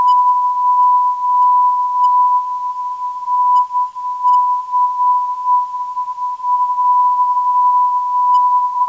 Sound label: NC 338kHz